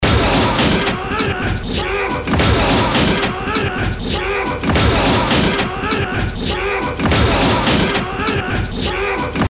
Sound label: danger fx loop